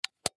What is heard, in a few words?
Plastic Replace Battery Compartment Remote Control Close; Foley; Blastwave